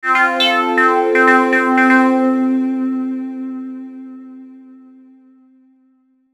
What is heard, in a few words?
Logo Synth Filter; Arpeggio Melody; Sonic Logo Sound Effects; Production Element Imaging Element Accent Transition